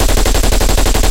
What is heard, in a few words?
loop sgmt origin, guns tanks